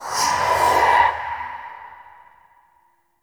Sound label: Wisp Light End; Warframe